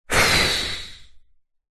human breathe out fast huff frustrated; zapsplat

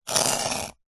nature ice block sharp knife scrape

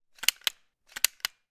gun carl gustav m45 swedish k; still north media

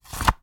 medieval seax place in sheath fast; still north media

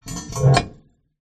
industrial lever metal old rusty pull

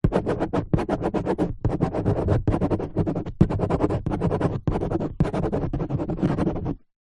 pen ball point writing empty card box; office

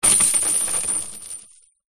industrial chain rattle set down wood

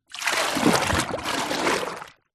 household bucket plastic submerge deep water

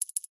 multimedia game sound coin collect

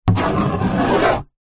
foley plastic smooth hollow sharp object scratch; zapsplat

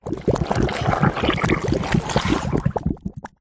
cartoon slime gooey mess bubbling movements ott; zapsplat

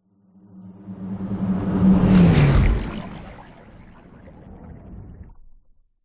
fantasy magic spell dark wet watery whoosh

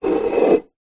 food soy sauce bottle scrape on wooden worktop; zapsplat

